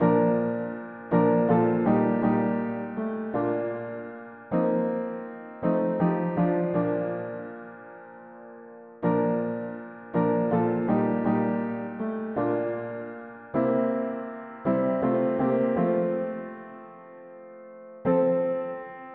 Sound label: mono piano, hymns; Viens ame qui pleure